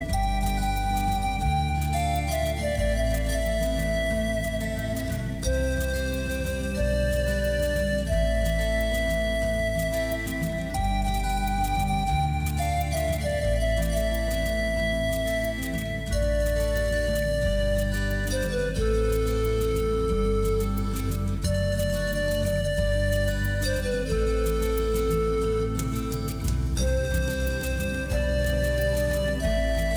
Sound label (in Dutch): panfluit